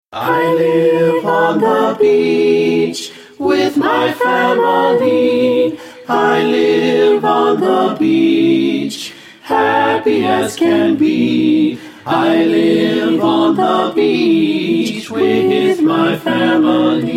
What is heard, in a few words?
beach